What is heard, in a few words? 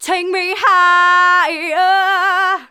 Female vocals and robo-vox